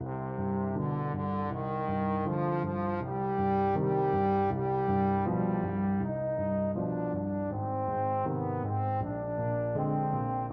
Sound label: ai kuinka kauniilta kuuluupi, trombone